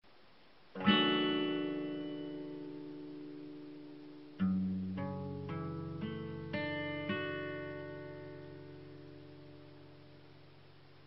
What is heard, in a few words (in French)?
Accord F diese m7